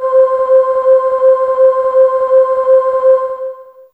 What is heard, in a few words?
Oohh; Humans2